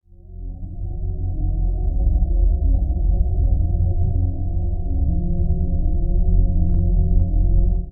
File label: tension